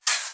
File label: 3xosc Open Hat